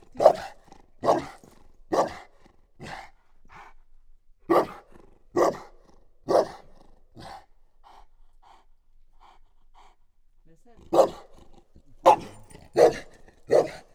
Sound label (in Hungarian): láncvégen csahol, kutya; Egerturistaház; termeszetben